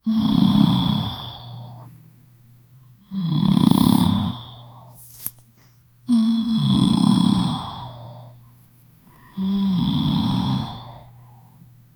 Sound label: girl snores loudly while; asleep